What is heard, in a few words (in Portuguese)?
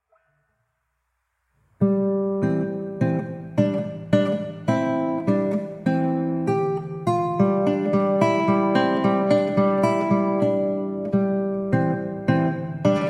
violao andante; Carulli